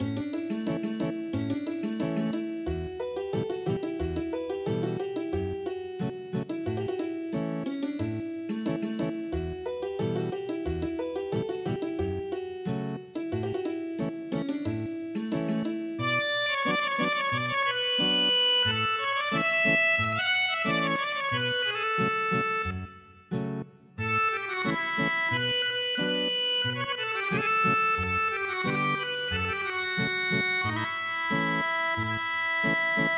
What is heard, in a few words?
song from turkmenistan Ethnic Guitar